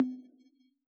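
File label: analog conga mid; AnlgKit1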